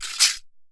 MARAKA SHAKE; Best Service; World Colours

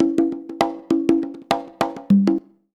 CONGA, Modern Percussion Loops

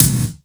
GATE KICK; Crypton - DrumBase5000; GATE KITS

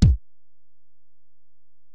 Ac BD, Alesis DM-PRO; 300 Drum Machines

